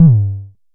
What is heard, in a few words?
analog pitched, kick; Ableton Foundation FreePacks